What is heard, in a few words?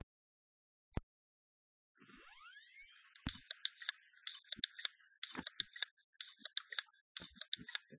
toshiba clicking sweeping